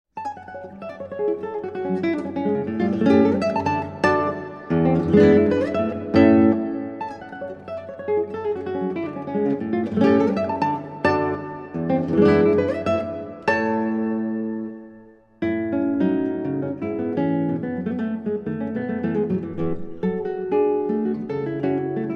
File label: Valses Poeticos, Vivo; 8 Valses Poeticos; Arr. Raphaël Feuillâtre